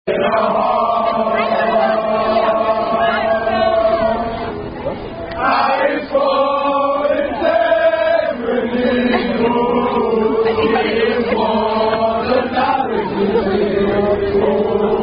Fraternity Chants, Unknown Black Greek Fraternity Songs; Malik Fraternity Inc Chants At Probate